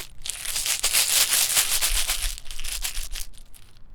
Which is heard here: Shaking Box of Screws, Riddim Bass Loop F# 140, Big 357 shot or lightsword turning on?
Shaking Box of Screws